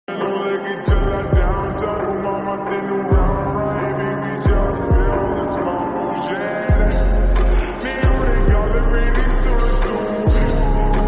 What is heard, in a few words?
slowed reverb lofi hindi song